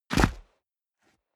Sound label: step boots concrete grit jump; Footsteps; schritte